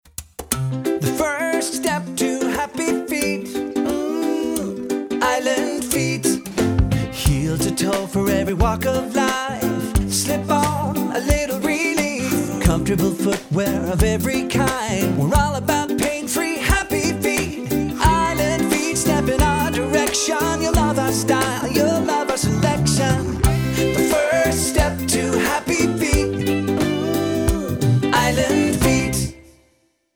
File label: Full Sing, shoe store jingles; Island Feet